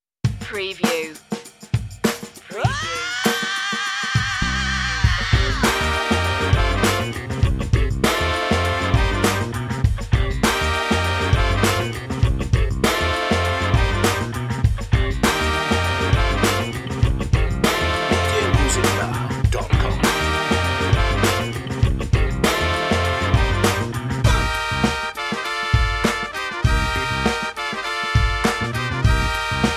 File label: funkin yell; 60secs